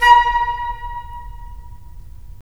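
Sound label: FL, flute; LA Composer vol. 3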